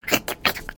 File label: Trex Running Growls; creatures organic